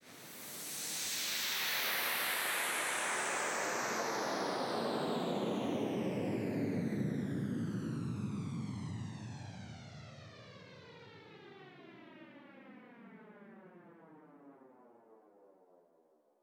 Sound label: SweepFx 138BPM; Sound FX; A#minor; Vengeance Samples; Fusion Kit